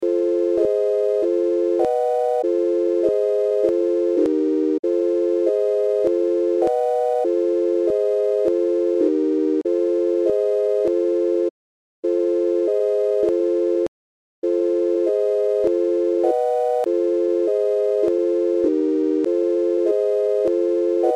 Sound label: SINE WAV SONG